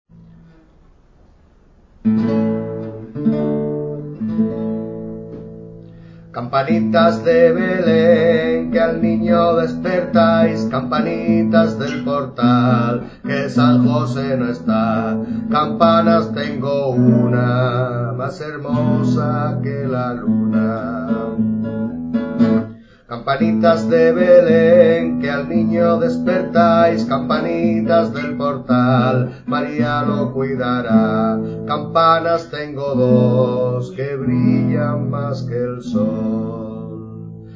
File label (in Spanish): campanitas de belén